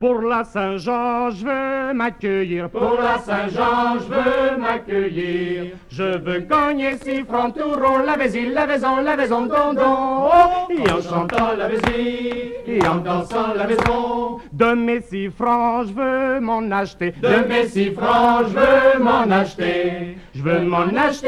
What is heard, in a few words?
alto